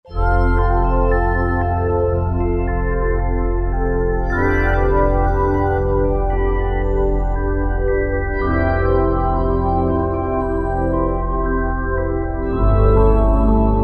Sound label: Strobe Pad, Roland JV-2080